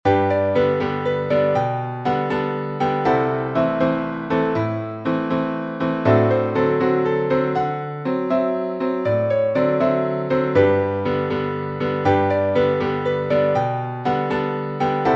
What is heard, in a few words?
piano